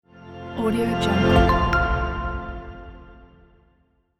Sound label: start logo; platinumcollection